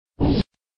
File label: GAMBLE START; Helena